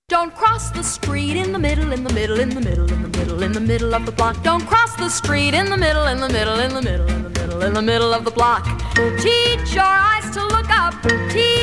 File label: Jingle